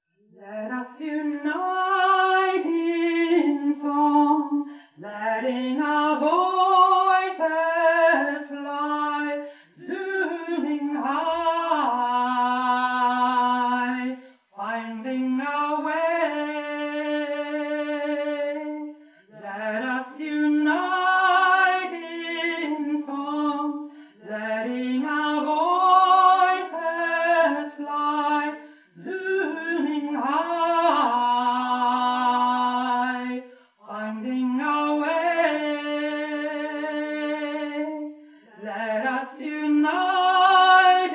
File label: zooming high tune